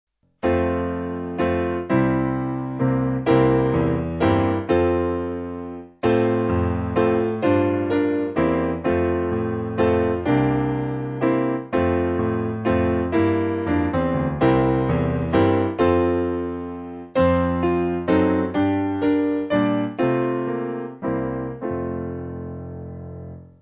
piano

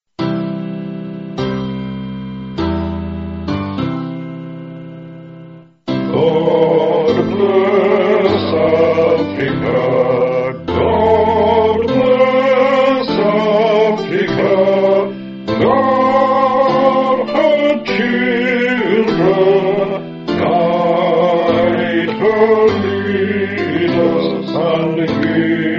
Vocal

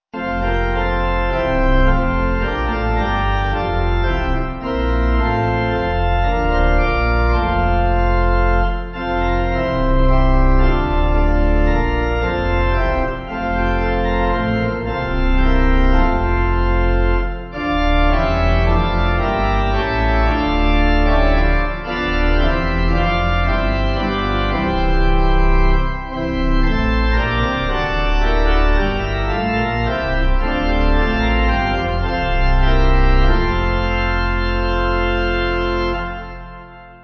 Pipe, Pipe Organ; Praise God For John; Noel; Noel 8.6.8.6.D

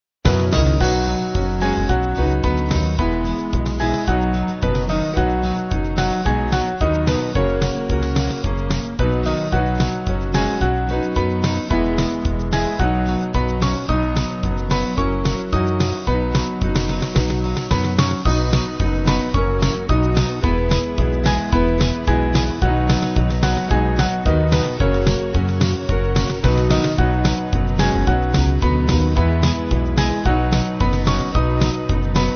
Band; There Is A Fountain

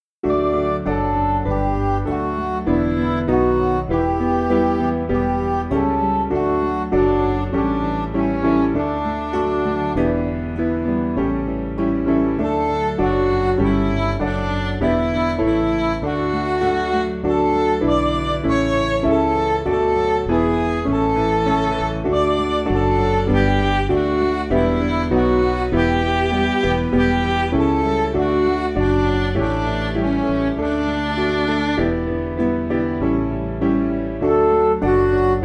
Midi; Forty Days; Buckland

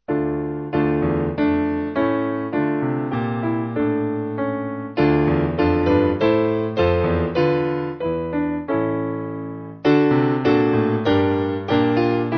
piano

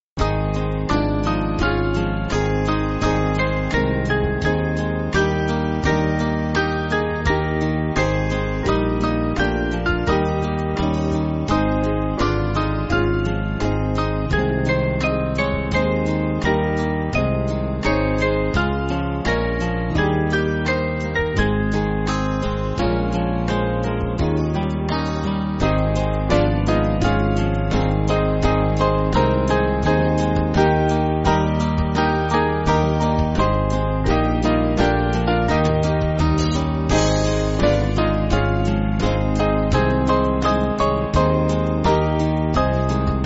Band; Hold Thou My Hand

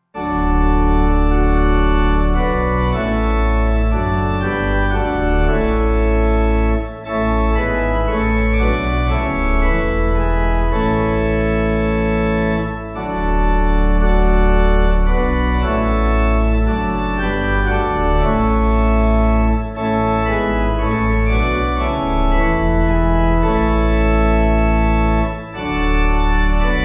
Pipe

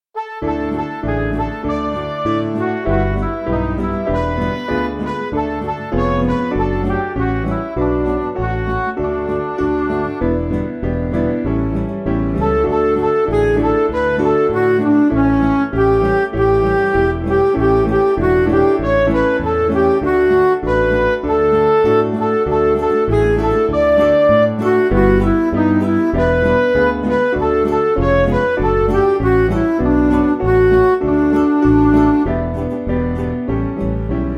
Midi

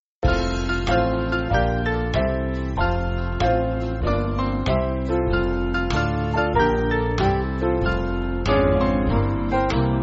Band, West Indian Spiritual; Mary Had A Baby

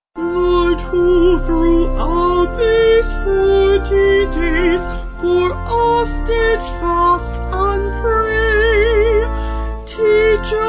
Vocal Organ; Lord Who Throughout; St Flavian

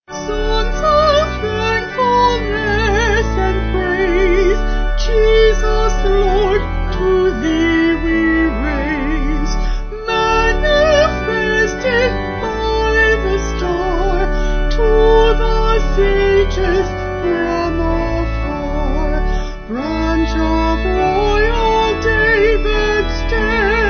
Vocal Organ

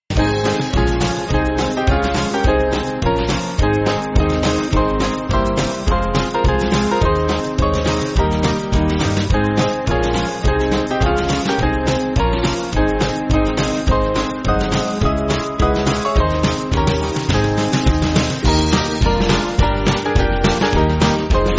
Band

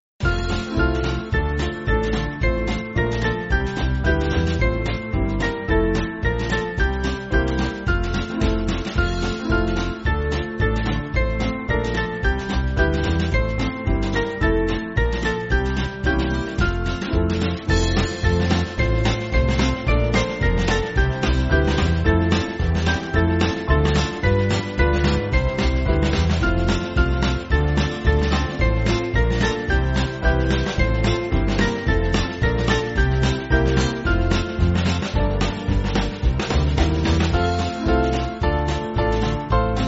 Band; The Chief Cornerstone; French Air

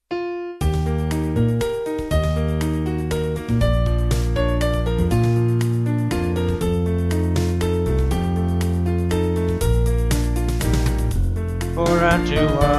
For Unto Us A Child Vocals Band

